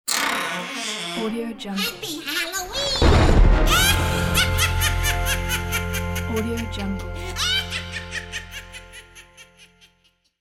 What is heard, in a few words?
happy halloween ident; applehillstudios